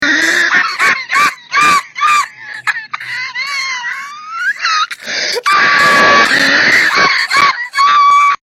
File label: Hysterical Laugh